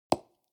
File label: construction wood